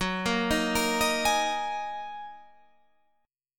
Gb 6th Add 9th Gb down Guitar Half Step Down; Listen to Gb6add9 arpeggiated

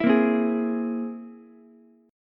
C Augmented 7th, Ukulele; strummed; JGuitar

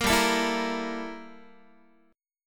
G Minor 6th Add 9th, Guitar; strummed; 4A,4E,5A,5D,5G#,6C#; Gm6add9; 13,15,12,14,14,x

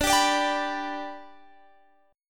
B-Minor Sharp 5th

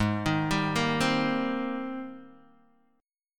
Ab Major 9th Ab Guitar DADGAD

